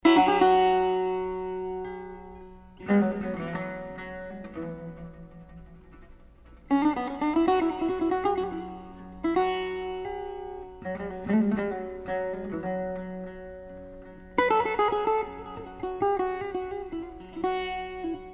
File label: Modulation to Maqaam-e Afshari